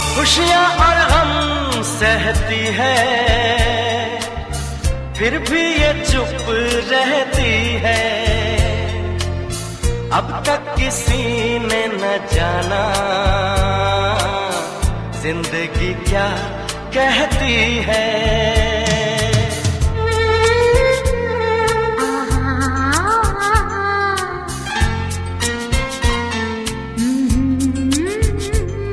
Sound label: Sad Male